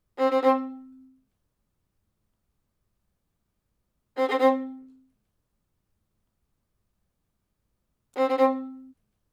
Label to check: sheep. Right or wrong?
Wrong